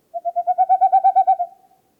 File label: Owl